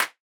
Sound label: clap; Electro; chillhouse